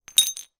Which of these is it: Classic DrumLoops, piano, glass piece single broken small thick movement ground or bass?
glass piece single broken small thick movement ground